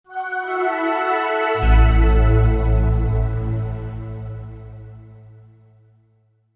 Shutdown, Shutdown, Computer: Short Musical Style Phrase; Miscellaneous Musical